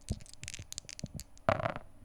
Dice board

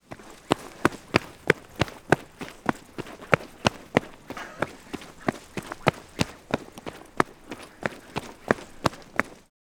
Footsteps Boots Run On Asphalt

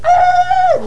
Death Monks

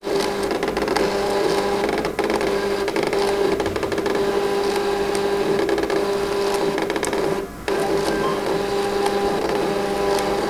Fax Printing

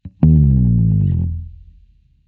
Bass Slide

